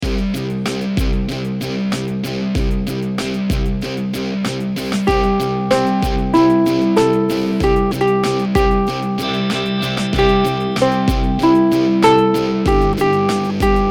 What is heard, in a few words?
Green Cats Eat Ants SLOW Tempo WITH UKULELE; Rainbow Ukulele Play-Along